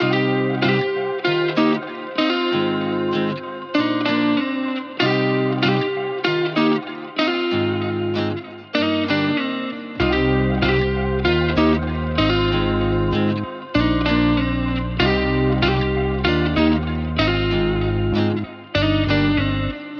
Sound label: 96 BPM C Min, Guitar; Rum; Cymatics